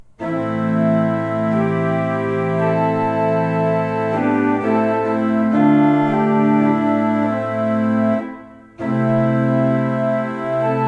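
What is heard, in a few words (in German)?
Baltes 6 I, pfeifenorgeln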